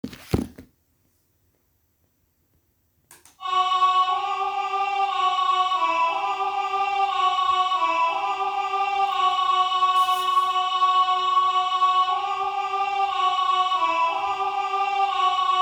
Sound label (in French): Barcarolle 2 voix